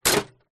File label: industrial small metal hand tool drop into metal tool box